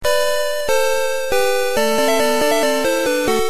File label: ride; P3D-Legacy; intro